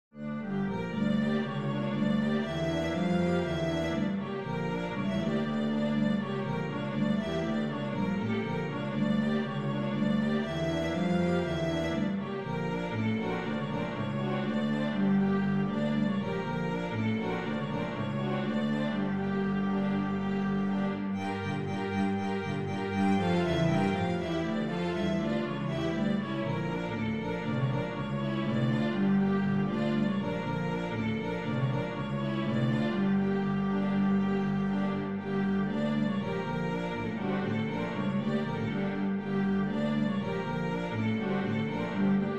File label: bulgarish full